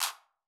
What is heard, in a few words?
clap